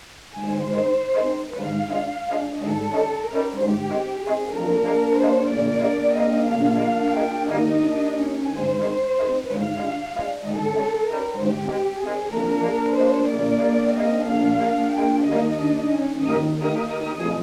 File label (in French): Valse allemande; Schumann; Carnaval; Ansermet, 1916